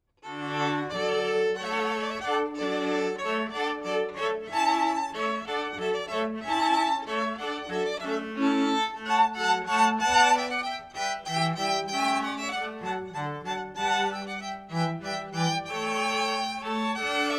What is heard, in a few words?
Hornpipe; Water Music; Handel